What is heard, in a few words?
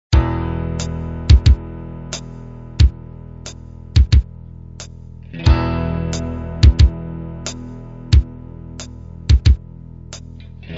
Sanctus Drums